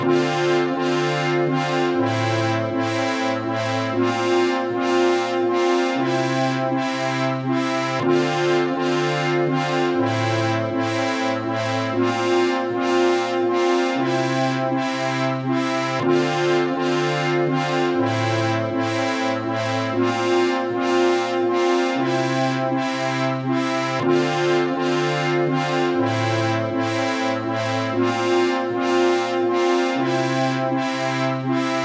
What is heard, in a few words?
multiphonics audio fx dry; hugo leger; mutate